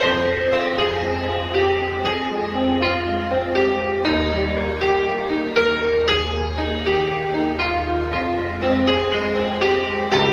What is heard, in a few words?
piano, Loops Sad